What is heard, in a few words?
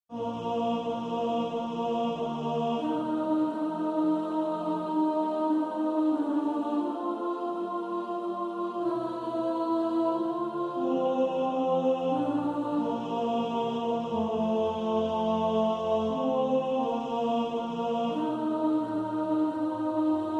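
When morning gilds the skies alto, choir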